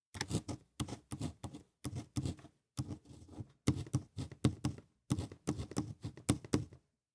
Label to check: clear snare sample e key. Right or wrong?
Wrong